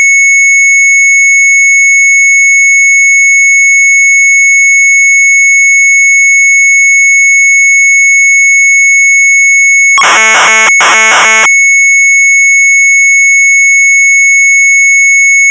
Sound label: MZ800 Tape, sharp; BASICODE 1&2 83; retromelsarchive